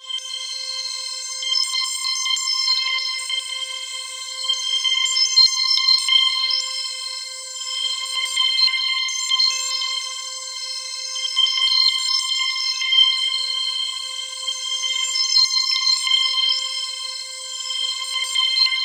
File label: C5, Roland JV 2080 TECHNO PAD